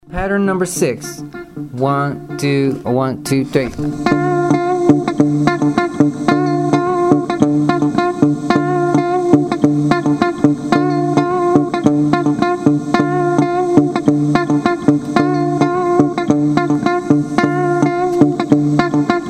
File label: mozambique patterns